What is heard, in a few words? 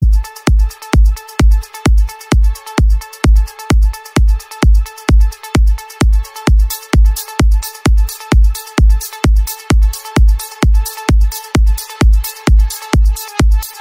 party rave hits bash mix; clubzone